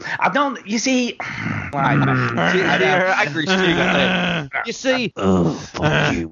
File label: grunt; Grunt